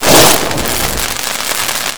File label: rogue anomaly electra